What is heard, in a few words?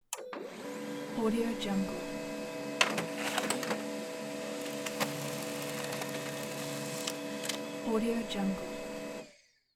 printer